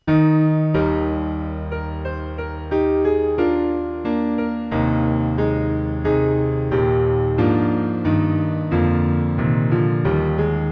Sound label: Piano